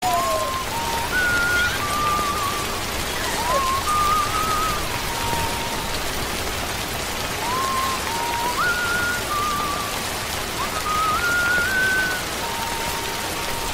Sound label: Whistle